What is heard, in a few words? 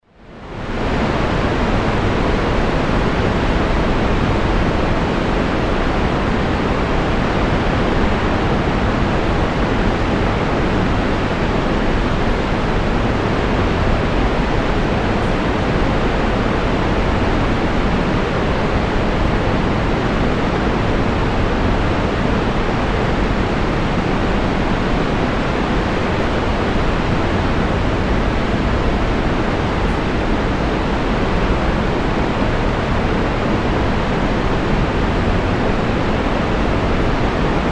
Niagara Falls; river